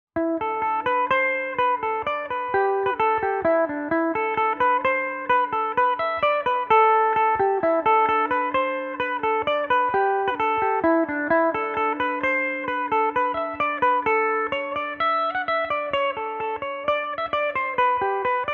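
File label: A dorian, irish mandolin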